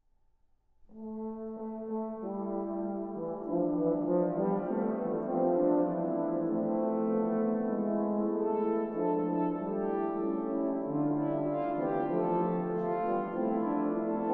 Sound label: Music for Brass III Canzon I a 5; Gabrieli